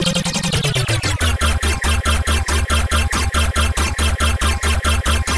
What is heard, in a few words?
Melodic Wave; random and out of place